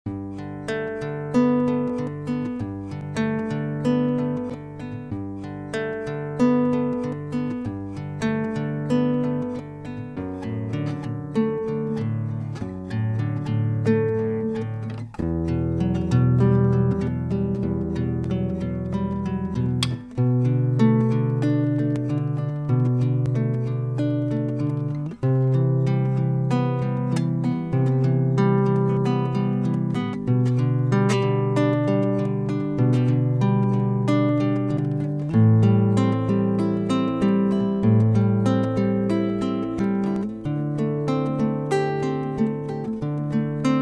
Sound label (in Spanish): El Padrenuestro Pista instrumental sin melodia